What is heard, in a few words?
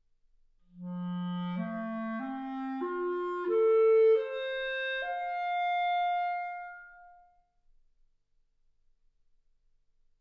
clarinet